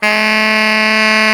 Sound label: SAX T. GROW, Tenor Sax